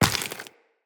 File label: Step Flesh, Footsteps Walk; Tenno